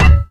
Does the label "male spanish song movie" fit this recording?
No